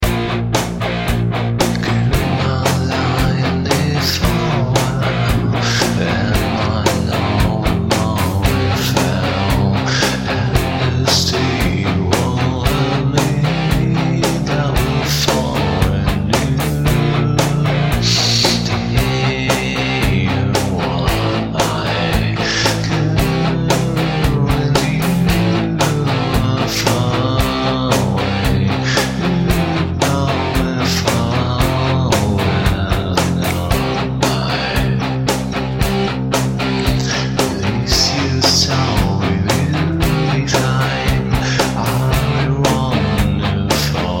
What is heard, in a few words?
booing 80 rock; UJAM